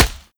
mud, bullet impacts; physics; Half Life Model Pack

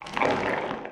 writhe, headcrab; creatures; Half Life Alyx; zoo

